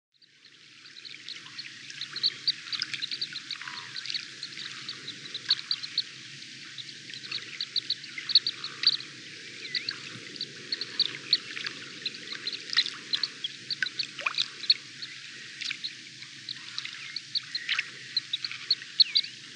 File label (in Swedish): smasnappa, fagelljud